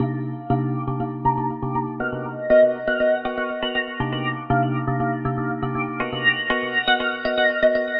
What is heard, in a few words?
120 tubular minor chords; KEYS ORGAN PIANO LOOPS